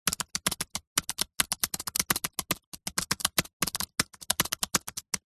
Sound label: computer keyboard apple mac magic typing short sequence